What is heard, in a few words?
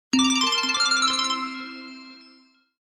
Samsung ringtone Cloudy Tone